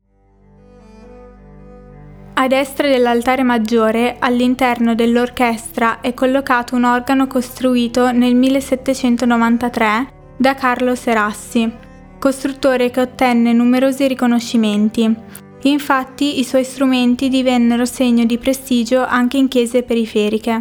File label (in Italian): organo; domenico; opera 2